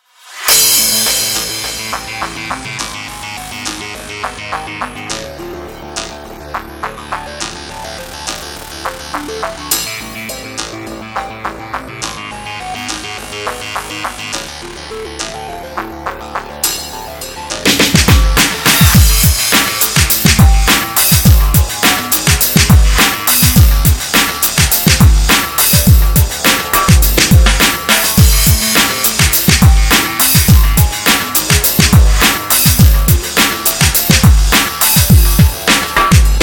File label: beat